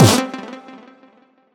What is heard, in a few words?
combo power; quatuor